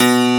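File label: keys, pop piano; Pop Pno + P3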